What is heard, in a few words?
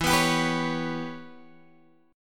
E Minor 6th Guitar Open F; strummed; 16,18,19,18,16,x; Em6